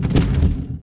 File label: globe dislodge, impacts explos